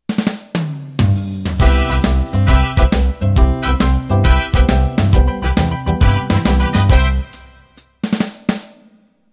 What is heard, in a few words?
50s Rock Intro